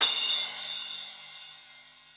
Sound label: Kit, breakcore Drum Hits